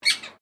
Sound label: Bird Parrot Indian Ringneck Chirp; Ringneck Parrot in Horsham Victoria; Birds (Sound Effects:Animals)